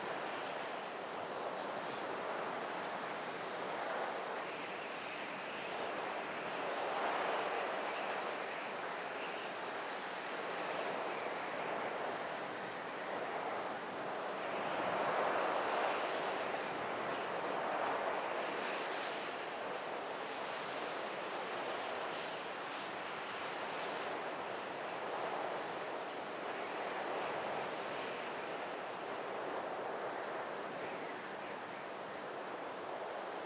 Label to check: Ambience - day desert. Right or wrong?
Right